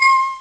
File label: Glass Mute, Amiga Soundtracker; ST-XX Amiga Soundtracker Sample Packs